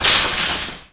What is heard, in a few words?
Climbing Fence; DS; NEWSMB